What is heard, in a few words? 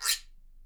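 guiro, Percussion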